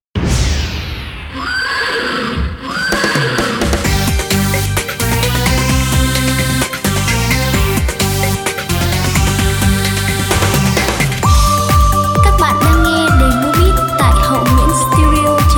beat

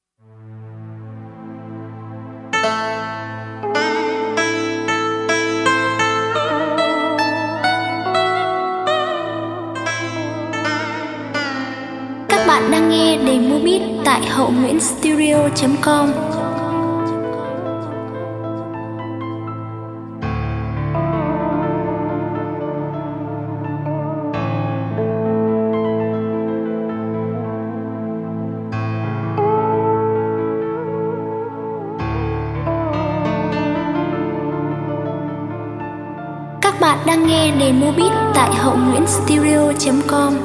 beat

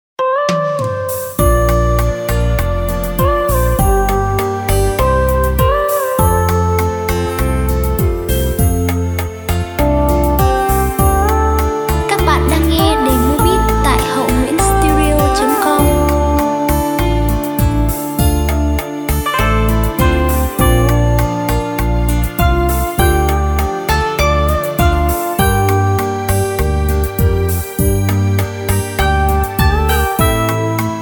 Du am mua ha beat

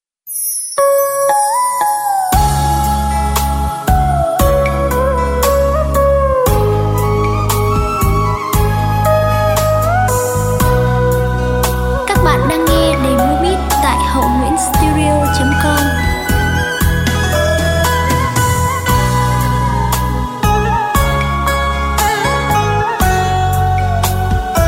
Dieu phap lien hoa beat

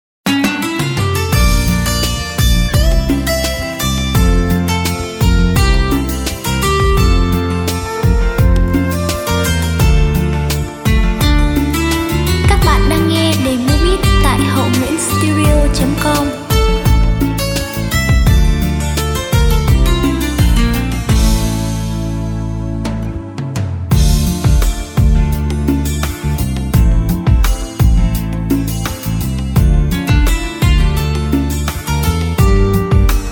beat

